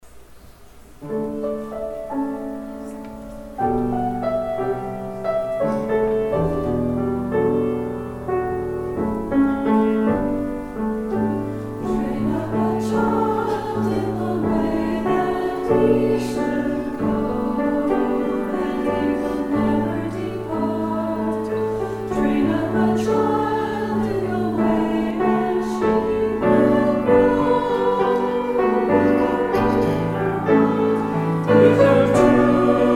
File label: Choir